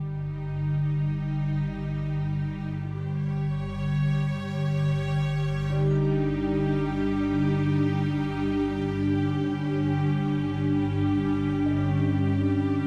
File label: loop Suspense